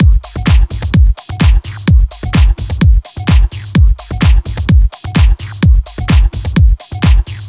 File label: 128BPM Kit; Vengeance Samples; VFH1 128BPM Technology Kit